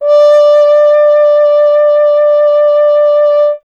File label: F. Horn; Miroslav Vitous; Solo Instruments VOL-2